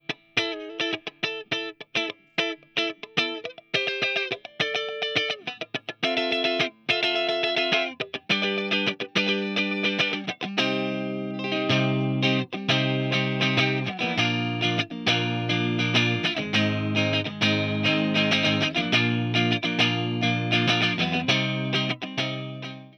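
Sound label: electric guitar clean; Earthworks SR20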